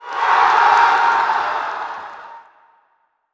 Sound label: Cheer; Pac-Man Championship Edition 2 Plus